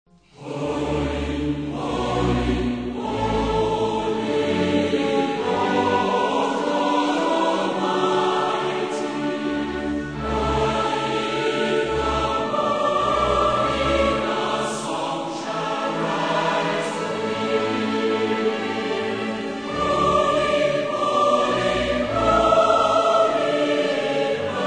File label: Orchestra and Choir